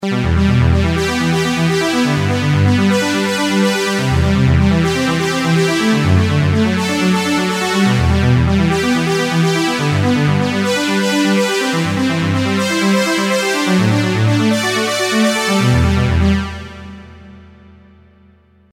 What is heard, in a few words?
lush bed, synth roland jd800; card demo